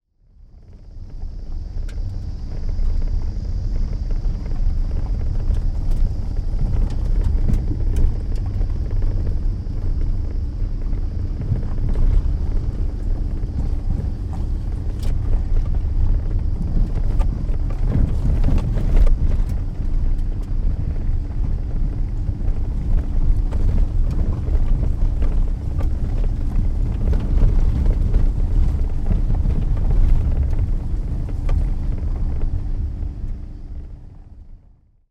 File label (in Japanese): jarimichi wo kuruma de; Chino Cultural Complex